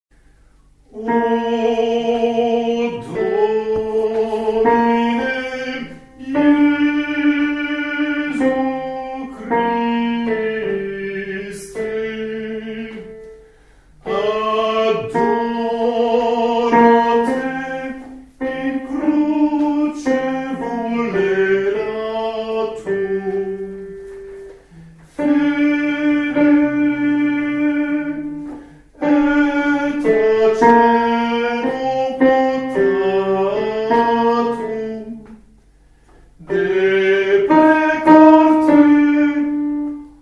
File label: O Domine Jesu Tenors